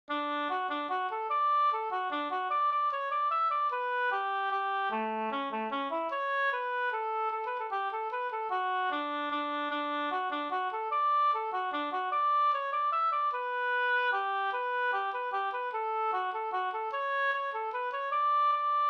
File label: mazurka; Nordstroms mazurka